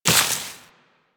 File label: Step Veg, Footsteps Walk; DE; Warframe; Tenno